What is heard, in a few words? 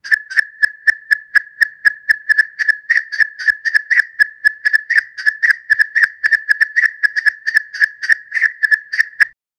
tyrannosaurus rex, dinosaur